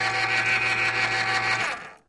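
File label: Electric Can Opener Open; appliances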